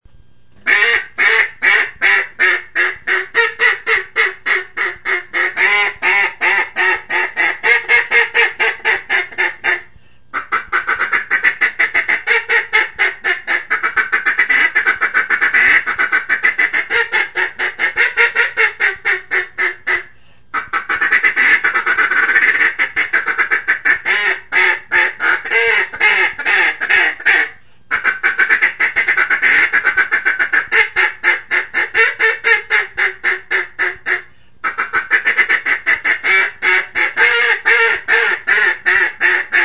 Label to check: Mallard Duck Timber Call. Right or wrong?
Right